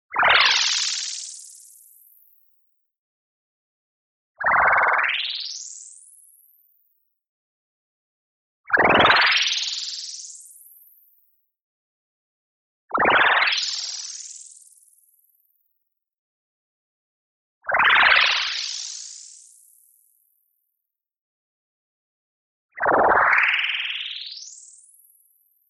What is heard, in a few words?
MOVEMENT FAST Upward Sine Wave Bubble; boom library; Anime Essentials; DSGNMisc